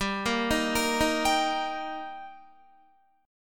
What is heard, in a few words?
G Minor Sharp 5th G Guitar, chord; arpeggiated; JGuitar